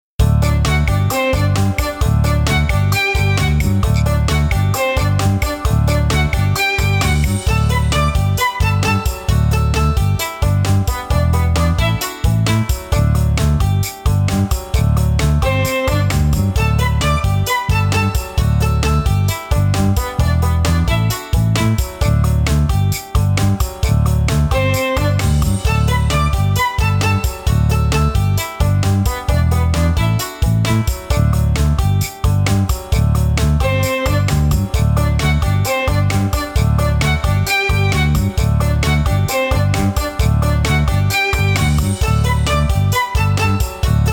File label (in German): Cowboylied instrumental